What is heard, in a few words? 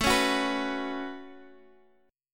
A 6th Add 9th, Guitar Open F, chord; A6add9 strummed; x,x,9,8,9,6